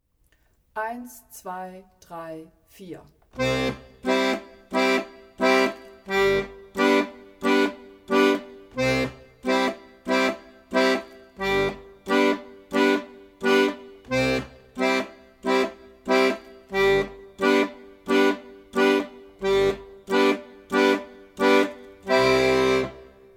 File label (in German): g grundbass und g dur akkord